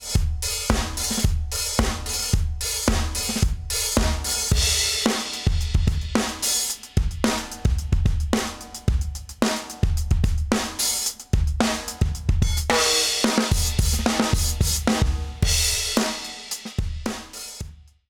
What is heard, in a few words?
DRUM; Stock Warm Audio WA76; 4:1 Ratio, 5db Peak Gain Reduction